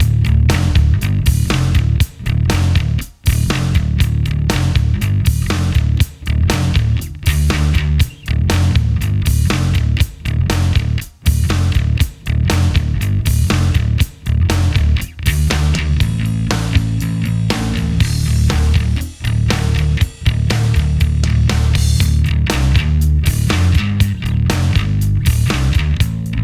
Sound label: bass mix eq